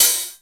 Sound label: HARD OPEN, HIP HOP; Pro-Rec - Synthsonic